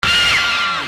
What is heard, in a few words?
Stab; Sonic Foundry (Sony Creative Software); Infinite Soul VOL-1